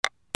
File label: BANGS